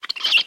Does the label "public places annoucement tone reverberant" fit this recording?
No